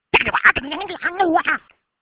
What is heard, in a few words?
alien laugh, scifi